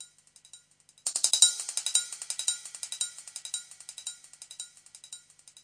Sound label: ringing echo hats, drum loops and breaks, 170 bpm